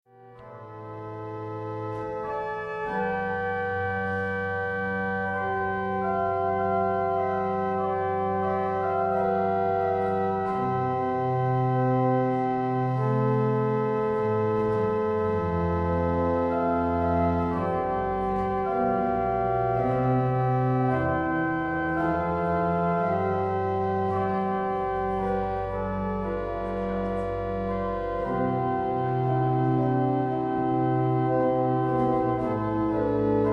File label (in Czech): varhany, jeden manuál, 12 rejstříků; Varhany mají jeden manuál, 12 rejstříků, byly však necitlivě zrestaurovány družstvem Igra; Johanna Heinricha Mundta